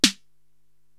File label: SD Analog 66, Korg TR-Rack drum machine